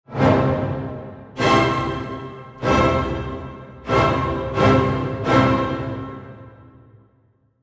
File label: pure space orchestral cadence